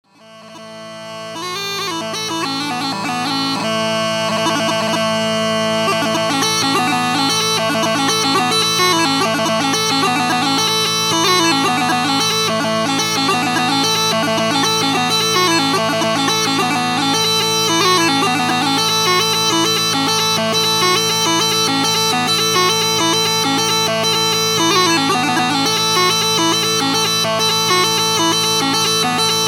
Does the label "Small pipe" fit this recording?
Yes